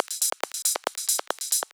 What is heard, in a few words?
Soothsayer Kit 138 BPM Loop, Drums & Perc; Bminor